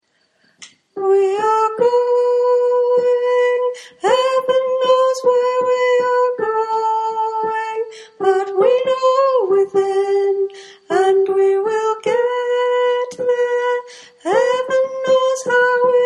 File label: Tenor